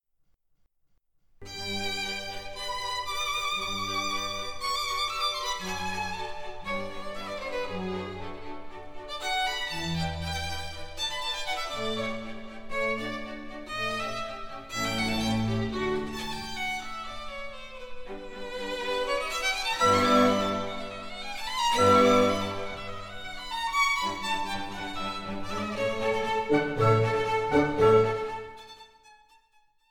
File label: Violin Con G